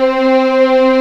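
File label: C4 legato, violins; East West; The Ultimate String Collection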